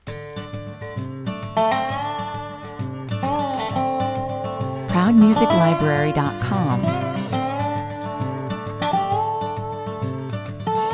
A real feelgood acoustic blues rock track featuring guitars and dobro. Cool, breezy, uplifting, 102 bpm